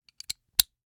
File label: Rachet, vanadium steel, 8in, standard, locking, clicking, mechanical, new, socket lock, clean; Eiravaein Works; Vaeyan IV